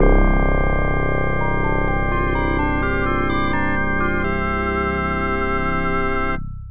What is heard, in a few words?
Jingle Save; Shining Force